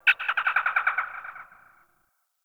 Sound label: Bird Distant; Warframe; Eidolon Plains; Ambience